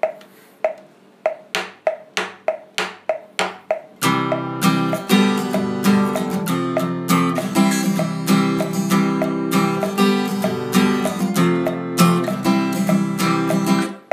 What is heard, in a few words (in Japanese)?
ギター裏クリック